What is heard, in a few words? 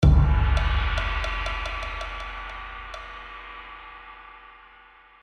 Buddah Bell